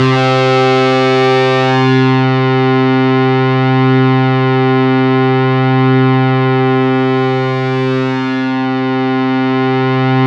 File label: C3, Synth Line; 90 s Sample CDs; NiceBeats; SynthLine VOL-33